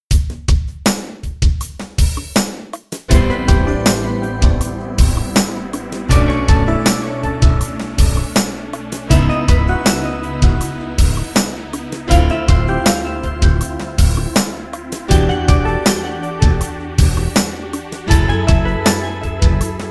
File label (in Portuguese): backing track tetrades teclado; Masterclass Tetrades teclado